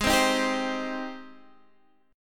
C Minor Ab; Cm/Ab strummed; JGuitar

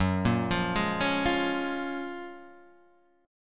Gb Major 9th, down, Guitar, Half Step Down

